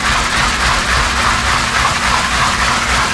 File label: idle, ships engine; Quantum Redshift; XBOX